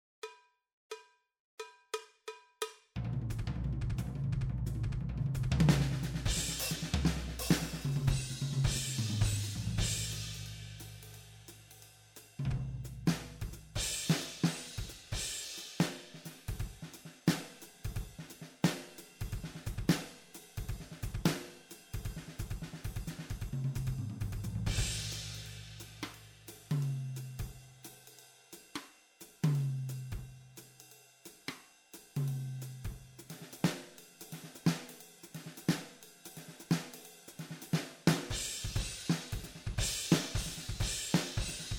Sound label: Jazz